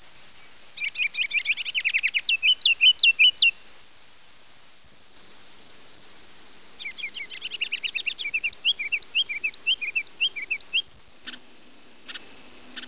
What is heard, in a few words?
ruby crowned kinglet, songs